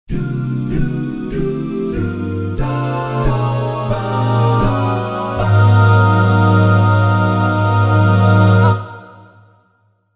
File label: Jazz Combo; Spectrasonics Vocal Planet; Jazz Group Multisamples